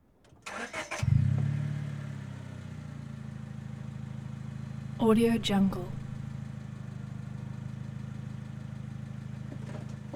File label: street race car start idle shift and away